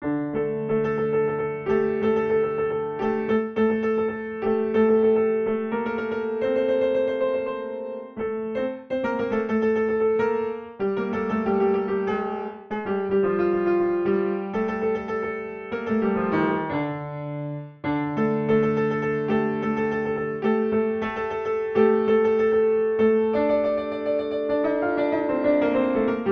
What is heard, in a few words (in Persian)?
Chaharpareh, piano; Abuata; Radif Mahjoubi